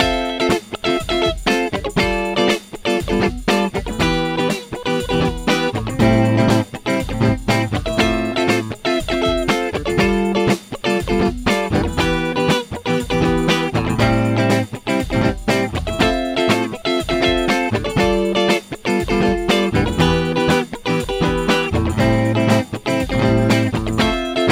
cutting original

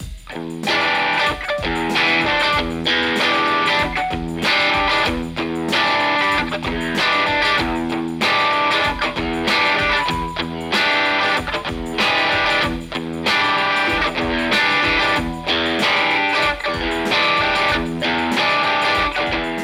AC30

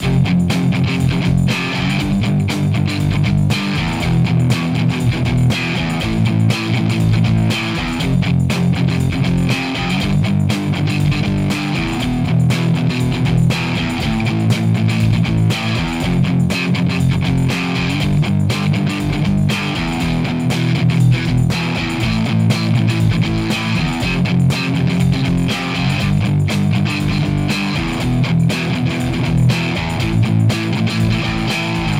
powerchord crunchier

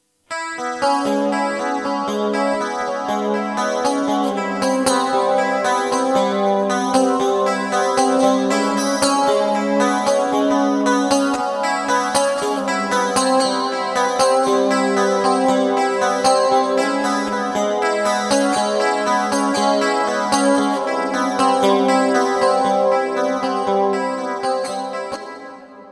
arp 80S BALLAD

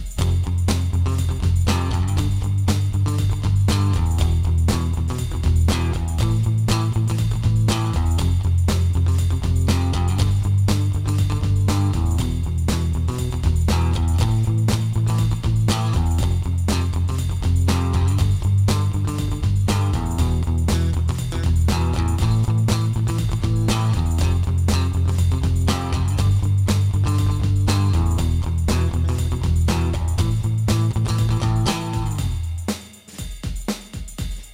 powerchord E acoustic

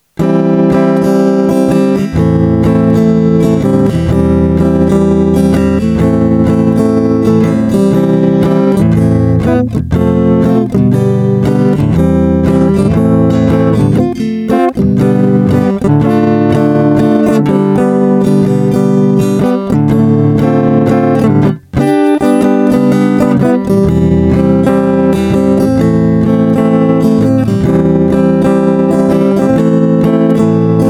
Original Guitar; SONAR TapTrack Fit

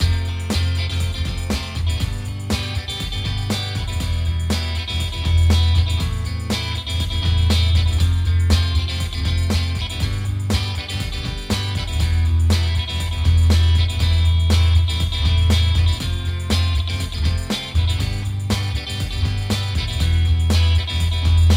middle Twang Live Acoustic

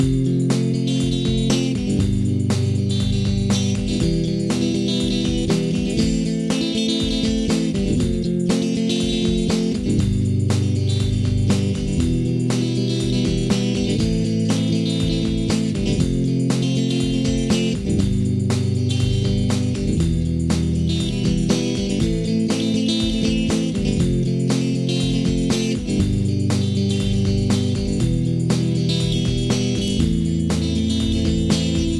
arpeggio

